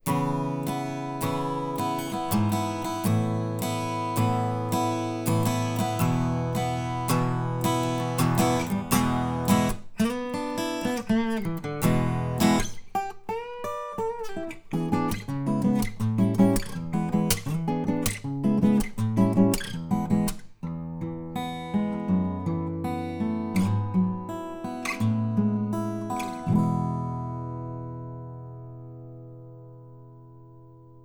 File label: Ac Gtr Gtr Ear R